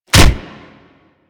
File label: Brawl Punch C; Warframe; Brawling